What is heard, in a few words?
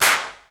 GATE CLAP; GATE KITS; Crypton; DrumBase5000